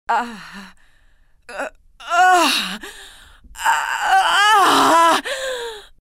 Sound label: Moaning; Cybil